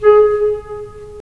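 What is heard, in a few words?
wind clarinet; LA Composer vol. 3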